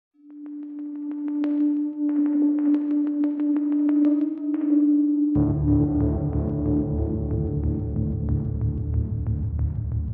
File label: Lead Motif Only